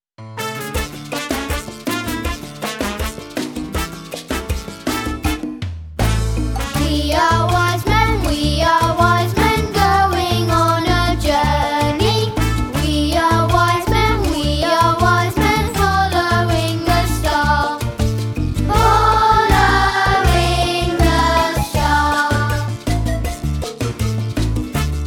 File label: We Are Wise Men Vocal; pendle